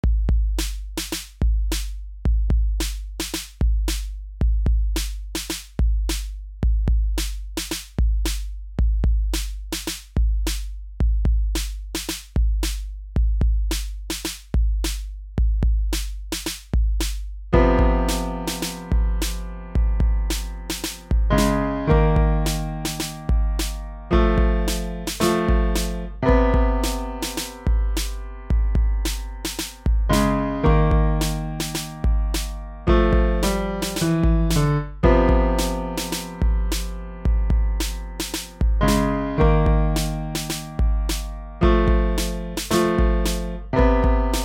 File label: instrumental